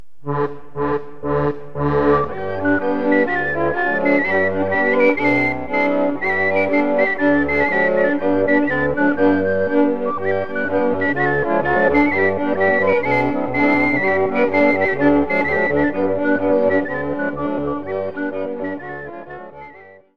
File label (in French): Allemande, Belgique